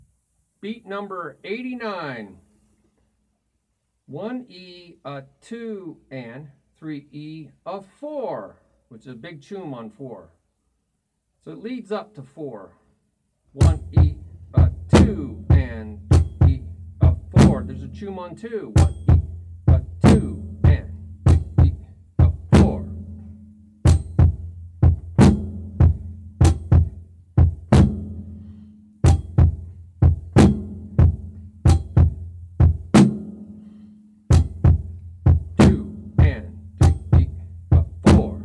Beat 1 e a 2 3 e a 4 Toom Ba Ba Choom Ba Toom Ba Ba Choom